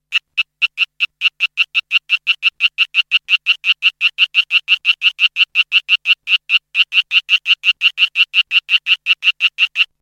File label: Litoria latopalmata (Broad-palmed Rocket-frog)